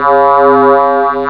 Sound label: BELL C4